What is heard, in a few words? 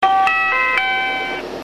bus chime; 国際興業バス